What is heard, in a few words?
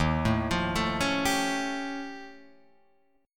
Eb 9th Flat 5th, Guitar, Drop D